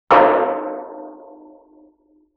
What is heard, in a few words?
Oil Drum Hit Med Sustain; Vintage Cartoon; Drums & Percussion